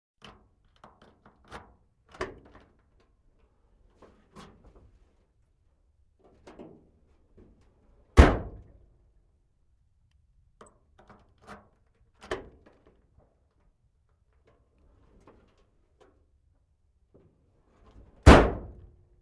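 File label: Truck cab door opening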